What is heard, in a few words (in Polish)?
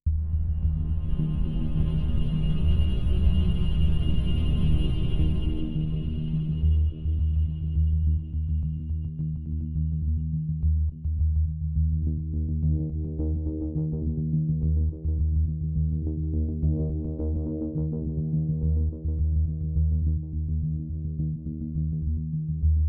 industrial, Pady; Muzyka Ilustracyjna